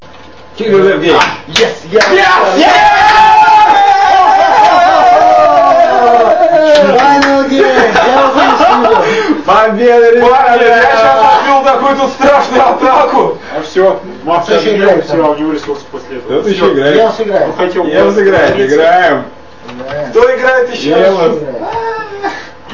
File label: win